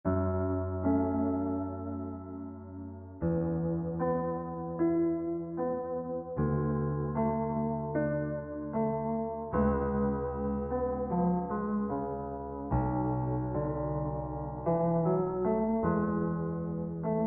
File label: Steingraeber Prelude fx